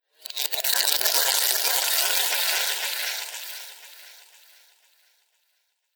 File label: Scary Ripping Sound; Ripping Sound Effects; Horror Sound Effect; Andrew Fleming